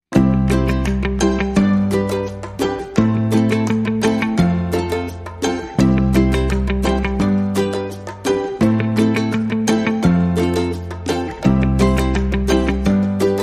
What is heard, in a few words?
No Melody